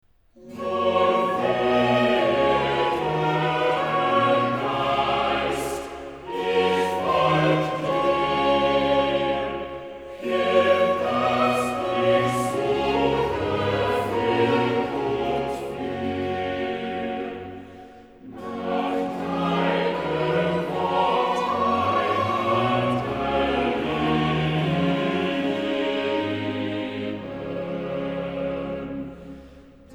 Chorale; Er rufet seine Schafen mit Namen, BWV 175; Bach, J S

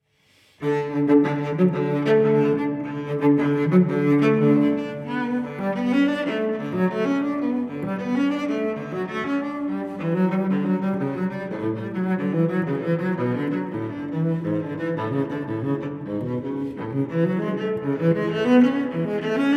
Cello Suite No. 6 in D major, Prelude; BWV1012; Johann Sebastian Bach

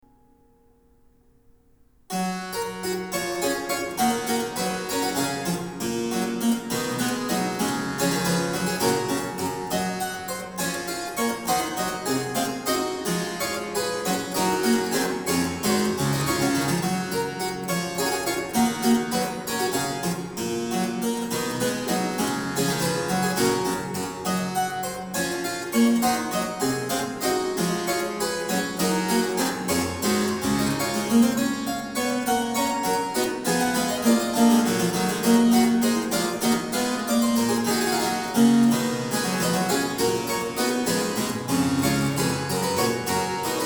Harpsichord; Bach, J S; Goldberg Variations; BWV 988

